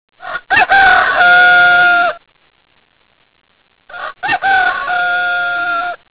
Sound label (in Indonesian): Ayam Kukuruyuk